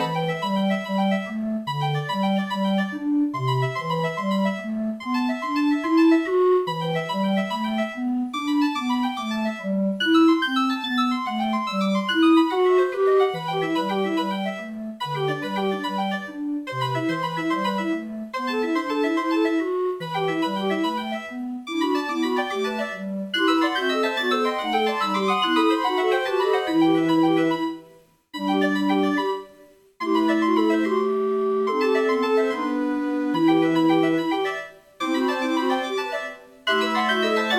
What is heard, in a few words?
calliope; narwhal; Parkitect